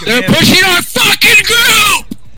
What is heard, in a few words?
sack punch scream